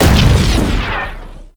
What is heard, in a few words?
Shred Explosion; Warframe; Temple